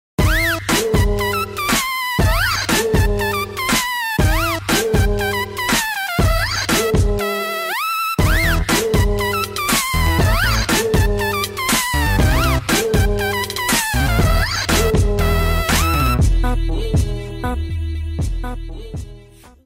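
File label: Instrumental Ringtone; Liger; Vijay Deverakonda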